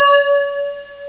Warm bell; AFiloFaxPro

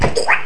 click

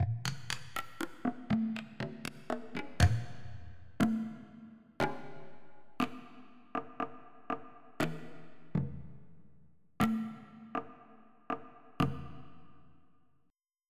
Ind Perc; SoundFont - Multimedia Collection - Volume 3

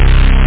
string; turrican-string4; Amiga 8-bit Sampled Voice

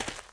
f r dirt; The Sum of All Fears

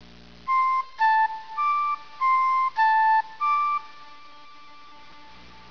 flute

